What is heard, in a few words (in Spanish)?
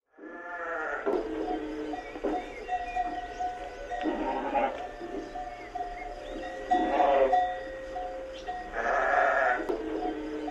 sonido de ovejas